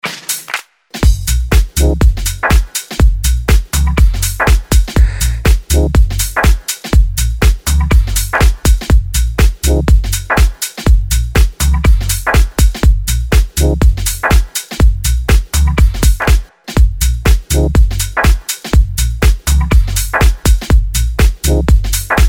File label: Like Jazz